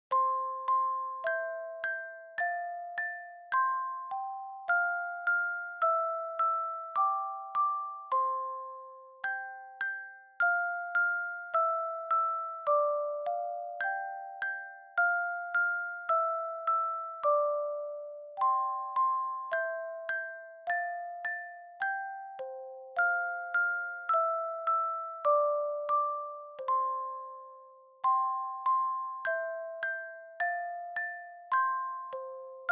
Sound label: Twinkle Twinkle Little Star, Dry, Fast